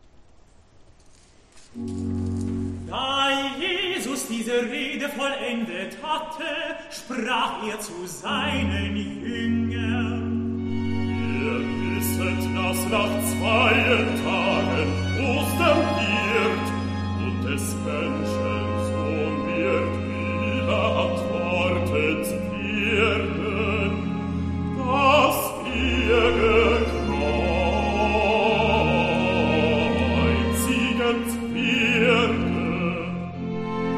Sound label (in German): Rezitativ; Johann Sebastian Bach; Pasja wg sw. Mateusza BWV 244